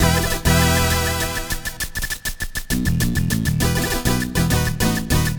music boss intro; darkskippy2; Skellboy

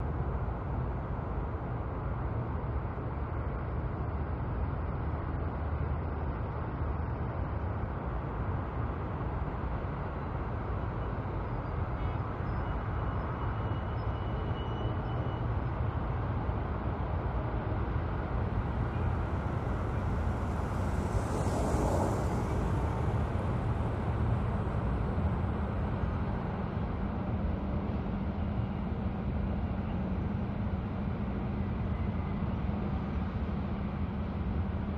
city night lo lp; The Sims 3; windows gamerip 2009

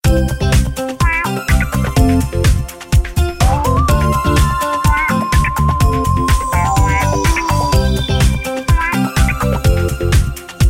Level Music; Family Restaurant

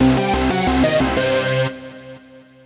Bleep Bloop end; Slinki

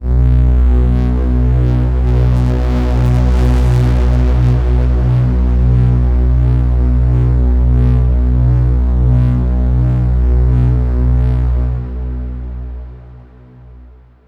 Overlay Drone

